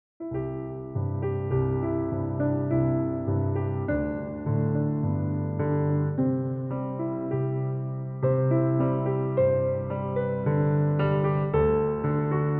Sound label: Piano Improv; Onward Christian Soldiers